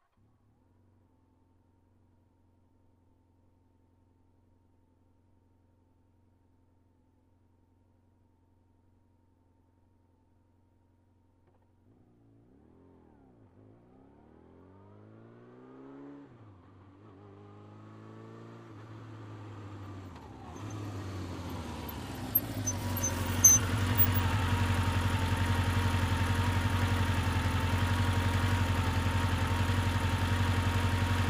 Motorcycle approaching, turning off

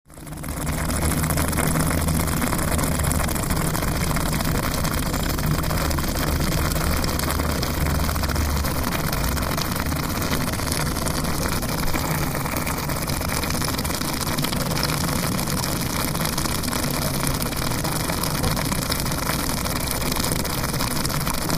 Water is boiling

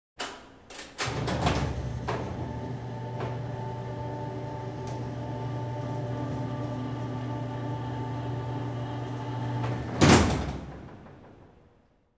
Garage electric door opens, closes

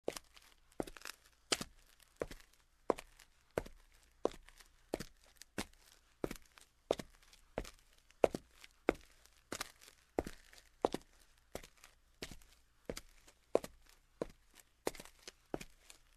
Steps on the marble floor in shoes

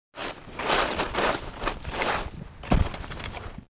Downhill skiing; snow

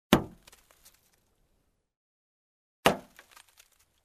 Throw snowballs at the car window

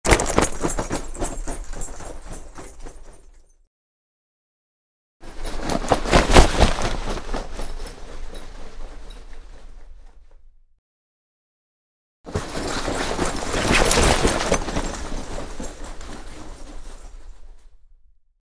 Running, rustling, metallic ringing; steps

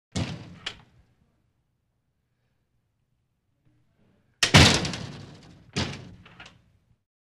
Wooden door opens, closes

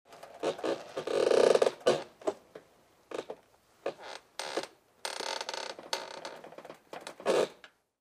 wooden door creak

